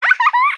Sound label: pleasing music sms ringtone